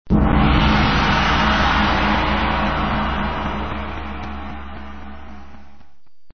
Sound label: Gong